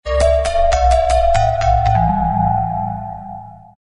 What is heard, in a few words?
mysterious ascending bell, fantasy